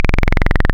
Vactrol LPG Saw, wavetables; Animoog

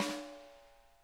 RIM, snare; Sonic Reality; Interactive Drum Kits

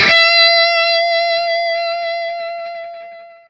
SINGLE E 5, Vintage Blues Guitar, ELEC GTR; USB Soundscan vol.22

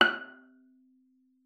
F#6, VIOLA PIZZ; Xsample VOL-5; 90 s Sample CDs

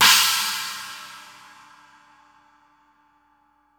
CHINA, Drum Dry; East Collexion; Drum 1 Dry

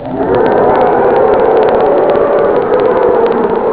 tv spectator; ST-XX Amiga Soundtracker Sample Packs

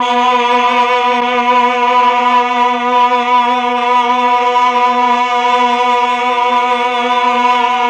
CHOR; ILIO; Propeller Island Legendary M400

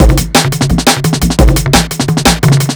E Beat, Electronic Beats

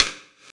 Hip House, Drum Machines E-MU Pro-Cussion

